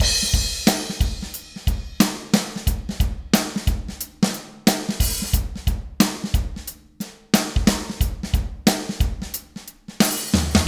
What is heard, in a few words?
Drums EX